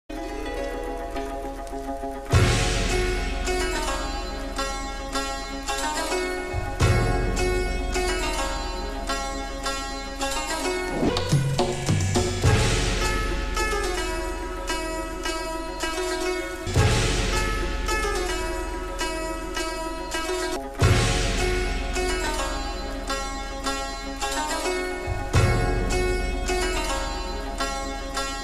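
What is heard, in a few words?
villain background music ringtone, Tamil; raguvaran; trending tamil bgm ringtones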